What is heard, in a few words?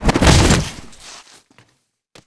Caiman die; Seer; GamigoNA